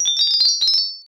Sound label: xmas bell